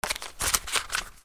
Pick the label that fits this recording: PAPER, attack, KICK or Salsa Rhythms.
PAPER